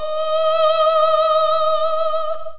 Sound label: Singer, Vocals